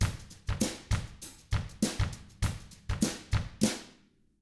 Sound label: FUNKY, Drums